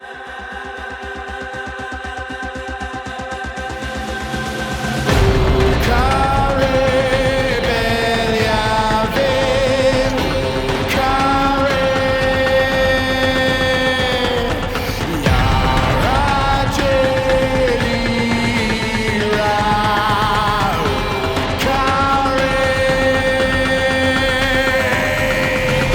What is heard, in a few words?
Login Music; Login Screen; Dante Unbound